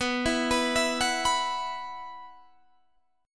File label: B Suspended 4th, Guitar, Standard; 19,19,21,21,19,19; arpeggiated; JGuitar